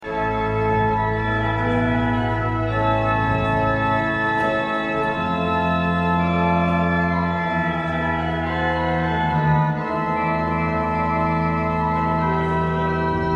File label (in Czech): varhany, dvojice klínových měchů; jediným zdrojem vzduchu do varhan je dvojice klínových měchů, které se musí zdvihat pomocí pák a lan; Manětín